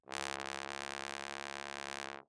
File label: Bass Trombone ff Db2; MIS Pitches - 2014; Brass